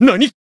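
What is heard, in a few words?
Voice damaged, Japanese; majuou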